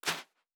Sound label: Footstep Sand Running; Character Sounds